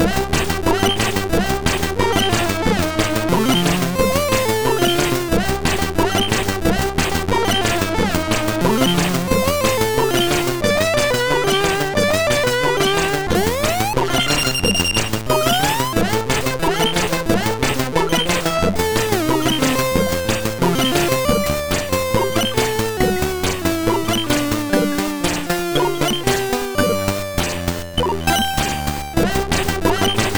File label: Go Music, SID MOS 6581R4; Spreen Andreas